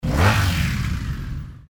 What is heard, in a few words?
motorbike passing